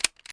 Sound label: DUD; Urban Chaos